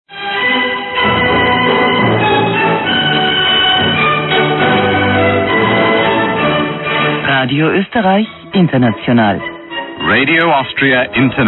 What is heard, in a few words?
interval signal; orf rai